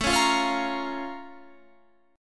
Bb Minor A; Bbm/A; strummed; JGuitar

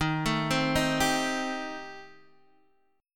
Eb 6th Flat 5th Eb down Guitar DADGAD

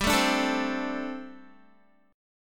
G Suspended 2nd Suspended 4th, Guitar; Gsus2sus4 strummed; 14,12,14,14,12,x

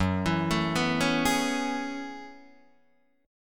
Gb Minor 11th Gb Guitar DADGAD

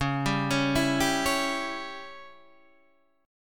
C sharp Diminished C sharp

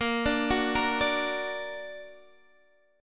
Bb Augmented, Guitar, Standard; arpeggiated; 18,17,16,15,15,x; JGuitar

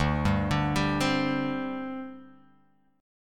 D 7th Suspended 4th, down

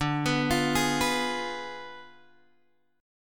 D Diminished 7th Guitar Drop D, chord

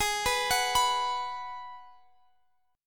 Ab Minor 7th down; Abm7; arpeggiated

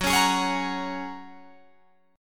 F sharp Major Add 9th, Guitar Drop D

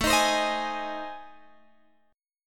A Minor Major 7th Flat 5th, Guitar Open G

